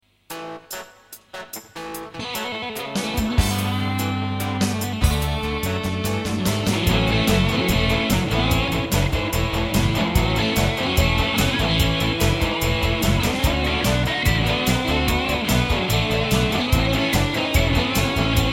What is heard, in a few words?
VOX Satchurator rock and roll